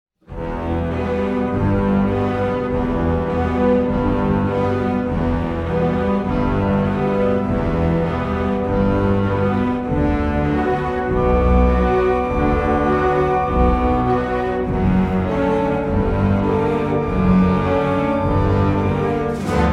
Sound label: piano